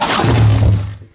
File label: salamander attack; DAoC